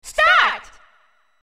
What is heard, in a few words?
Start